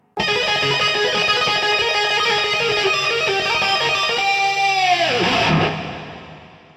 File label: lick; brutal legend